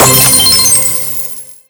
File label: Coin Big Pickup Large